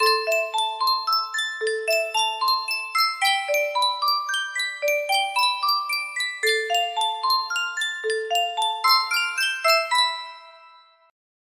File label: Sankyo music box